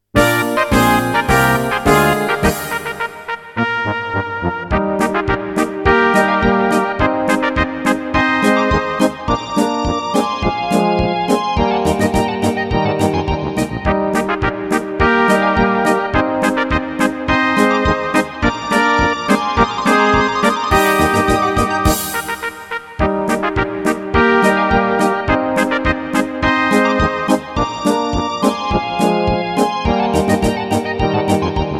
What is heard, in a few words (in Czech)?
polka